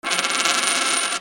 MONEY

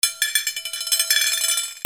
FALLING

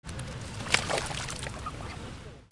BIRDS

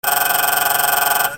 BUZZERS

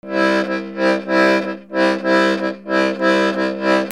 MUSICAL

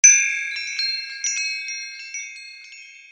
BELLS

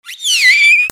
PEOPLE

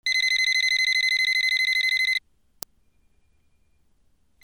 PHONES

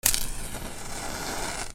FIRE

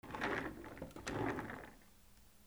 SQUEAKS

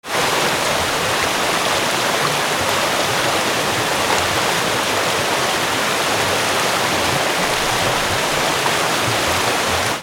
WATER

